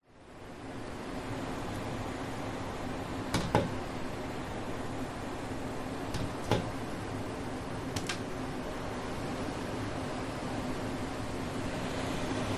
nature wind outside open window bathroom wooden blind light banging